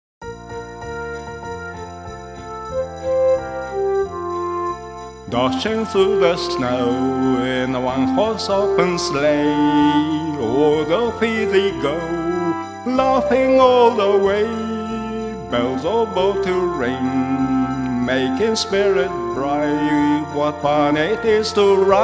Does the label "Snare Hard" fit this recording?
No